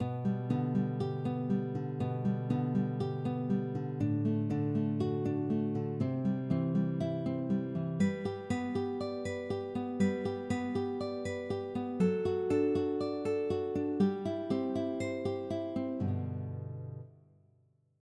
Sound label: Plectrum